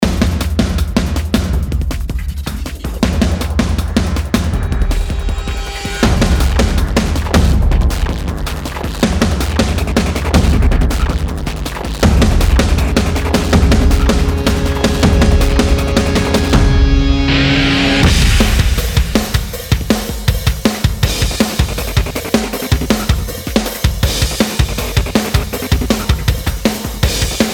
drums

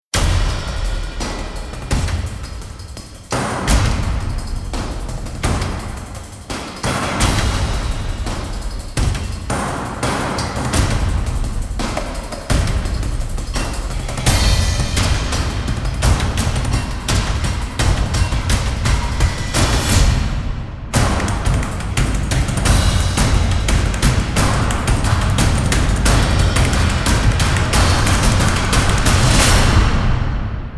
percussion only version

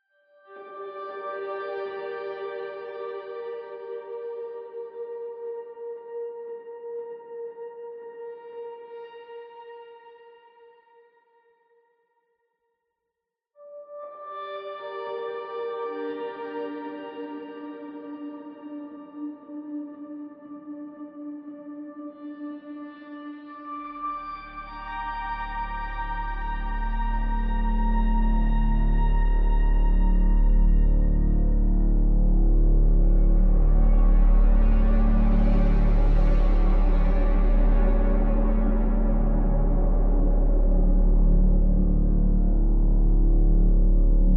ambient

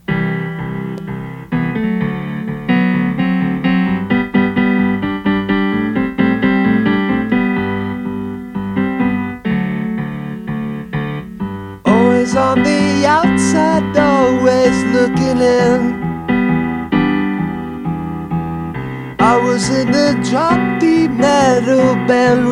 piano

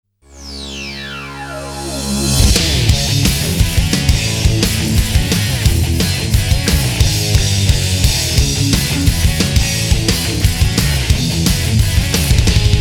main riff; sonic adventure 2

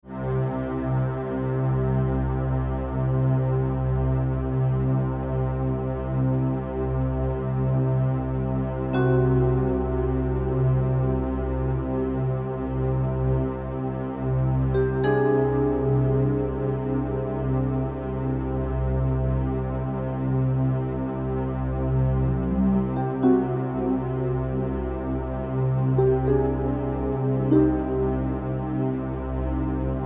synth and vibraphone

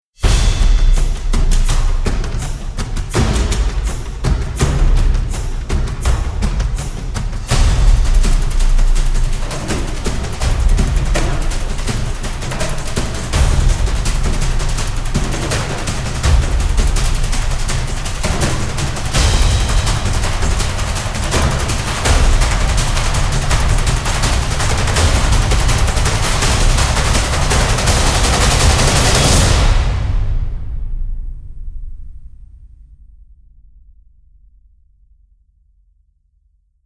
percussion only version

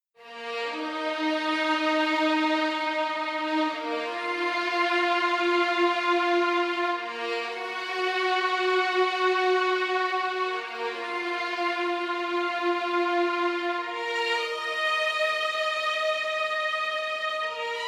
rise strings long

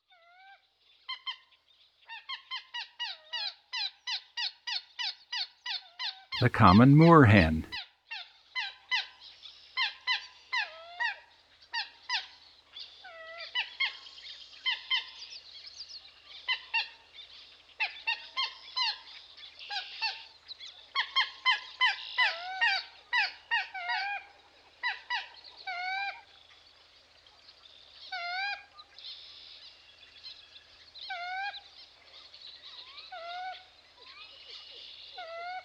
common moorhen; dan gibsons solitudes

